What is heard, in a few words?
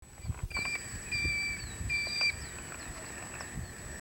whistling heron